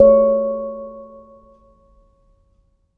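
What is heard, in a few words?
C#4 light, bar; foxdot default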